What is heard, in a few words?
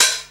HH hh; Best Service Gigapack 2